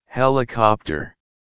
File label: helicopter